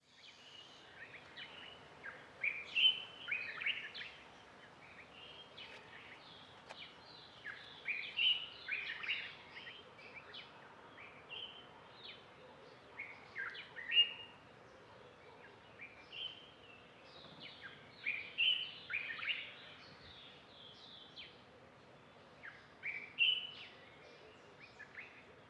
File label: Morning Garden Ambience Long